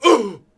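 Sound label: Swordsman Hit Vox; Civ4 warlords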